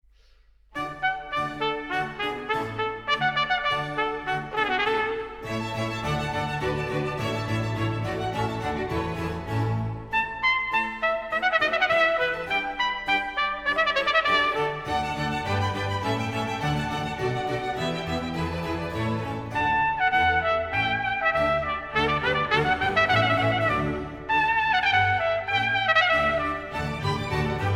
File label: Concerto in D major, Allegro grazioso